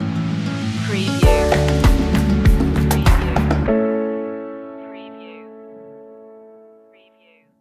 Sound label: natural tendencies stinger